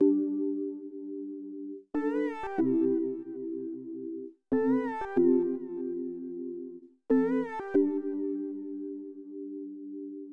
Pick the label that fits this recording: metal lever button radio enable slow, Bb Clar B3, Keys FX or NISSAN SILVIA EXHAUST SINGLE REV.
Keys FX